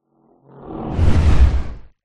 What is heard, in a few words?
sound design whoosh impact hard wind; zapsplat